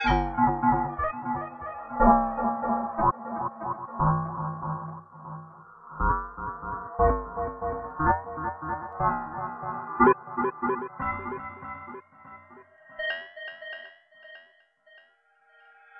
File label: Tape Arp; Sonic Foundry (Sony Creative Software); Ma Ja Le Ethereal Textures